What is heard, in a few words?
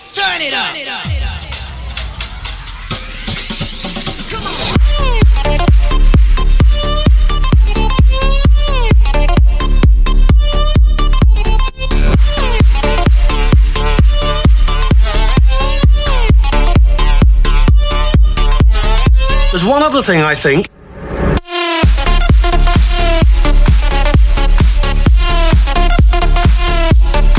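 electro ringtone; samsung j7; samsung ringtones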